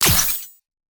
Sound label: enemy kill; Zenith-Vector